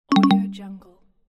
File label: button